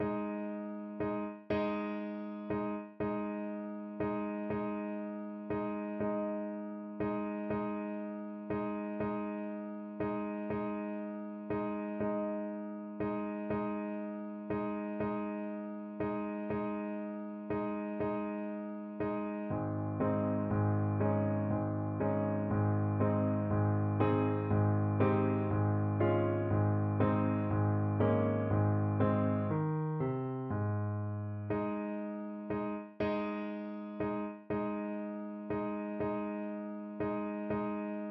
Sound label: horn, French Horn